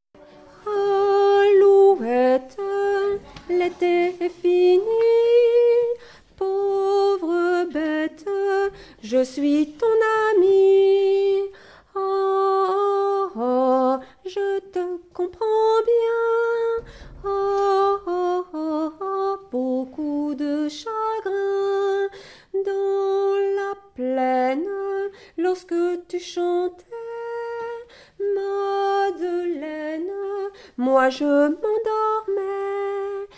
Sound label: alouette, Basse